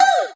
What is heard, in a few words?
Vocal Oneshots; Vengeance Samples; Vocals